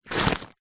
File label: foley paper sheets handful pick up grab